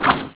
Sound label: munch